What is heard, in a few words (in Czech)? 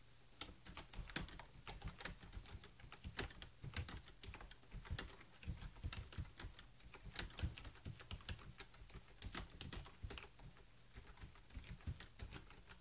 Logitech G110, klávesnic; Logitech Gaming Keyboard G110